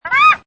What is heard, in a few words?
Duck call, Single, short sound of duck calling; can also be used as another bird or exotic bird; Lynne Music